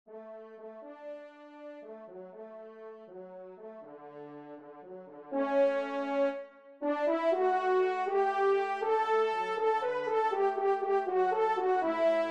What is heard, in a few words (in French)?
trompe; La Cuenol; 1e Trompe; Rhône – Lyon